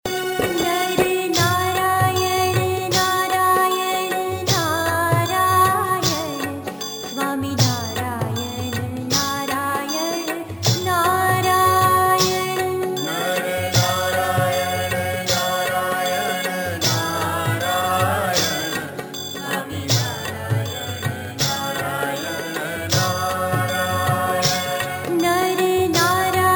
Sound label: swaminarayan dhun